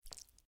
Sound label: Sticky; Granny Chapter Two